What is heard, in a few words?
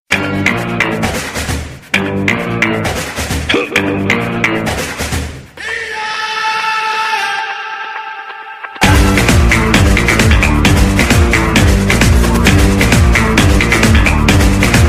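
background music Ringtone; Ra One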